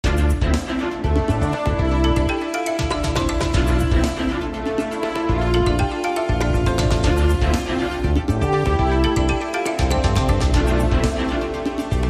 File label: Commercial Break Segue